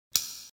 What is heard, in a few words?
Click